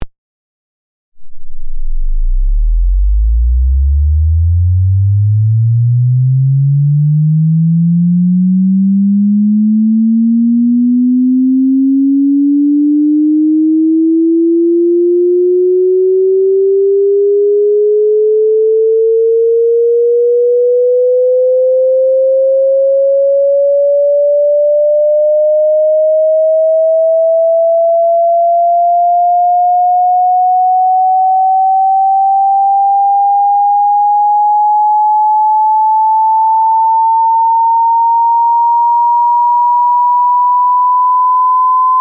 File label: Sinus osc from 0 to 5000 hz slow